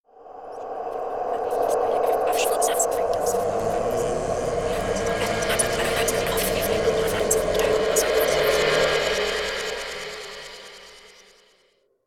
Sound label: Sting